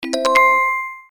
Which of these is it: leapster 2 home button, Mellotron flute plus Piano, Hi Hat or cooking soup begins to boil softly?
leapster 2 home button